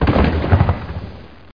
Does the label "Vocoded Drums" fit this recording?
No